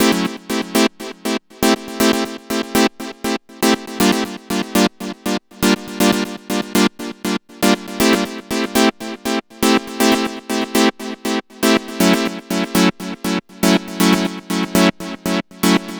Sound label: Progressive Synth, Trance